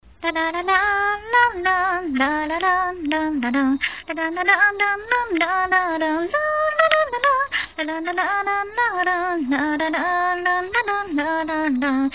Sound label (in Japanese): hanauta